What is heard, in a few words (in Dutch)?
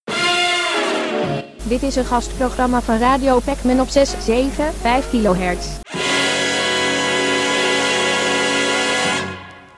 jingle; Pacman; Gast programma